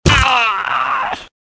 die, pain death, male; Soldier Of Fortune II Double Helix; XBOX

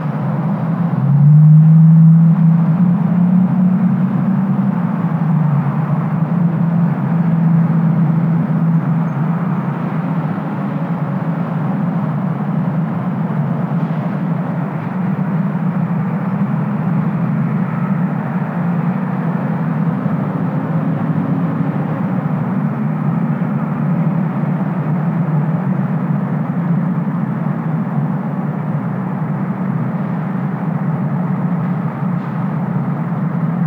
city light, Ambient; Project Gotham Racing

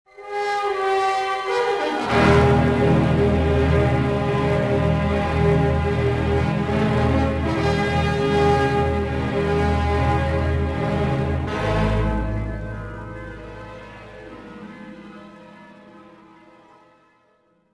dark; Star Wars Jedi Knight I Jedi Academy; XBOX

